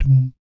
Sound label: BS DUM, Vocal Planet Jazz & FX; ILIO; BASS DUMMS